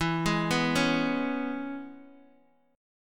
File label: E 6th, down, Guitar, Open D